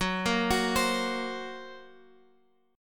F sharp Major Flat 5th F sharp, Guitar Open F; arpeggiated; 18,17,18,19,x,x

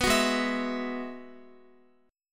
Ab Minor 7th Sharp 5th Ab, Guitar; strummed; x,x,9,11,8,11; down; 4E,4A,5D,5G,5C,6F

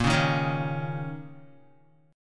A sharp Minor Double Flat 5th, down, Guitar; strummed; 4F,4A,5C,5G,5C,6E; JGuitar

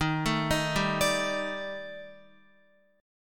Eb Major 7th Flat 5th Eb Guitar 4E 4A 5D 5G 5C 6F; EbM7b5; arpeggiated; JGuitar

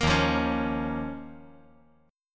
D sharp 7th Suspended 2nd; strummed; JGuitar

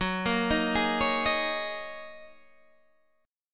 Gb 6th Add 9th, Guitar, DADGAD; chordshape; down

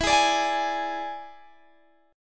E 7th E down Guitar Open G

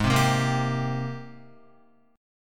Ab Major 9th Ab, Guitar; AbM9 strummed; JGuitar

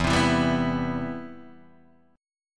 C Major 7th, E, guitar chord

